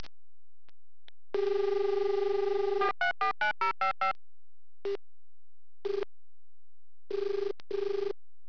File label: EWSD ring